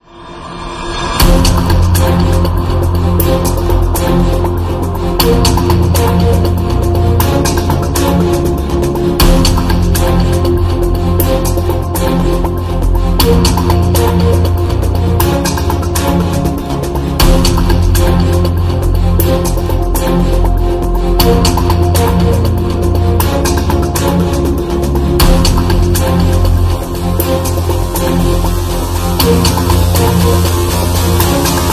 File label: Suspense